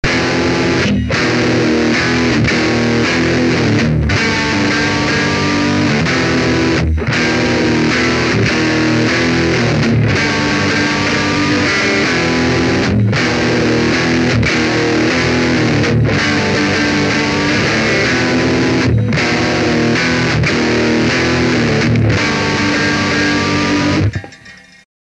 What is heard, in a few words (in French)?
guitare satu, metal